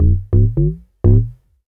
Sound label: lop 126bpm, House Instr and Stab Loops